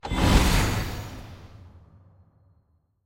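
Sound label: library music puzzle solved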